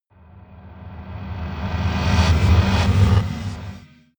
rise noise crunch

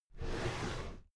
drawer cabinet paper open regular halfway

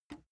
plastic button small simple release

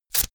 velcro large open fast

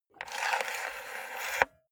cassette player antenna retract medium

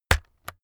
keyboard external key hit slow

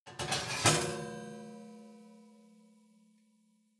oven baking sheet draw out

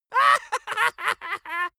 dwarf laugh gleeful

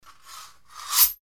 fencing sabre

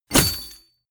chainmail impact

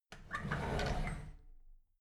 drawer big squeak open regular

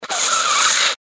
sniff

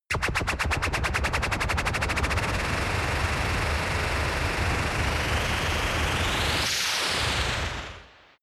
fast ufo liftoff

